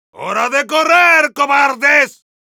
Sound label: yell, es